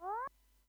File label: uncloak; ilwrath